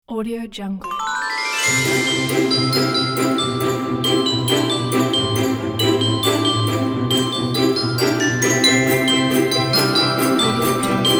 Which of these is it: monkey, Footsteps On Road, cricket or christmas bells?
christmas bells